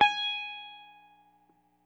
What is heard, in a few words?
EL SITAR HRD; Quantum Leap - Guitar & Bass